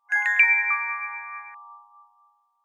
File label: no more waiting, message tones